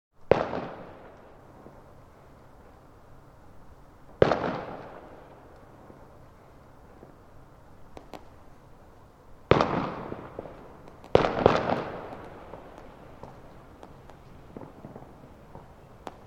Sound of distant gunfire shooting in urban ambiance with natural reverb reflection; Sound of distant gunfire shooting in urban ambiance with natural reverb reflections from buildings.; War